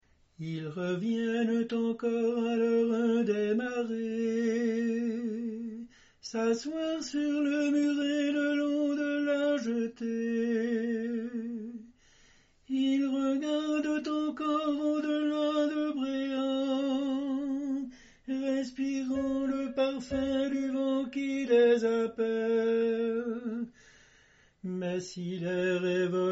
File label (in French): tenor; loguivy de la mer